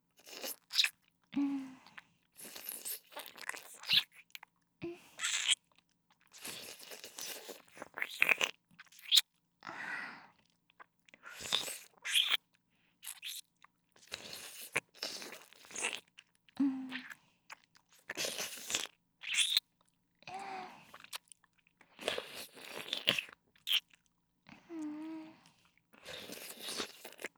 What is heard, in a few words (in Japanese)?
ディープキス音